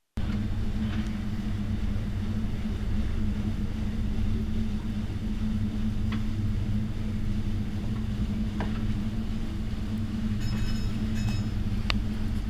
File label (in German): Der Motor aus der Ferne; MS Gisela